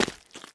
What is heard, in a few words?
mud, footsteps